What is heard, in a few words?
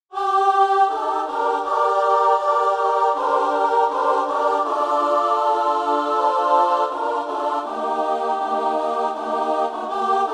midi; moniuszko; na skrzydlach piesni